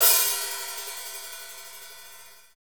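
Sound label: HAT hi-hat, Pop Country Dry; Northstar; Drumscapes Roland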